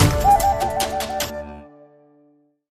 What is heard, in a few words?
big win increment ended; games; Aztec Gold Megaways